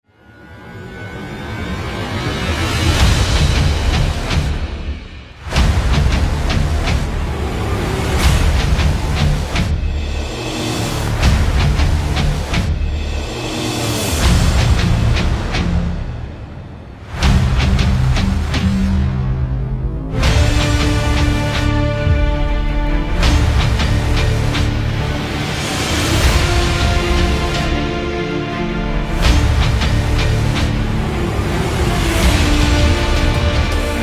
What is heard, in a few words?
Epically Powerful Trailer